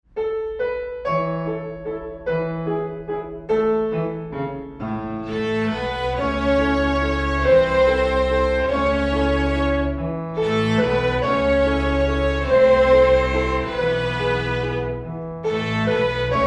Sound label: Cowboy Waltz